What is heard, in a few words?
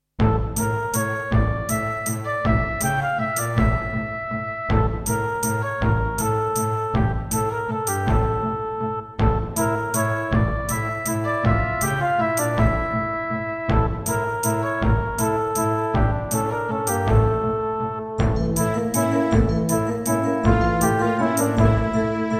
Roland SC-55